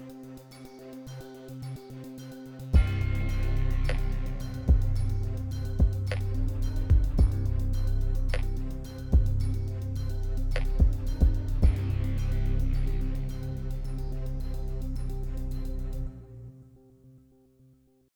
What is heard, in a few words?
suspense hip hop; Jamie Coleman, Film Composer